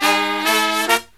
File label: Killer Brass Riffs, 108 soul